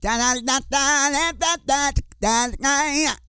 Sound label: SING, Vocal Planet R&B & Dance; ILIO